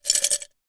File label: broken glass pieces inside glass jar shake; zapsplat